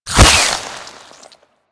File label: Infested Face Hugger Fire; Warframe